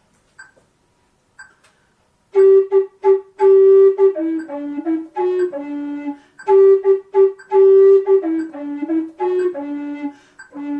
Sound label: Gaillarde G Em, FLUTE